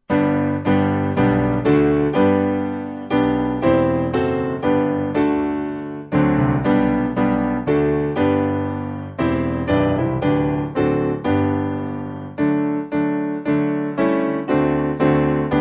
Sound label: Ye Servants Of God, Lyons, Piano